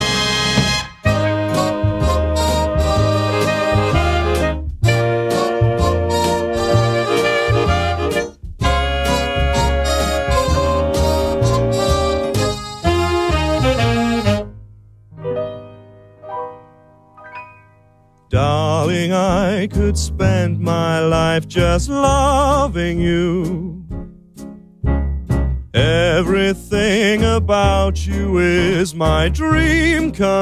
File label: Soft Shoe Shuffle Blues